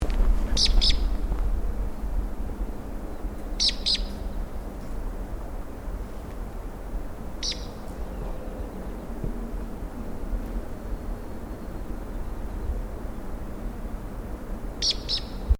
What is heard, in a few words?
gold billed magpie